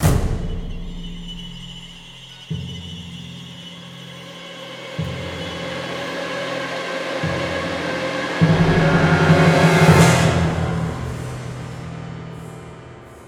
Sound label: Cinematic Music Bumpercars; The Park